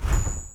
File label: MOVE; C64 Dreams; Multi-Sound Default